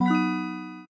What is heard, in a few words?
card legendary, loot box; BTD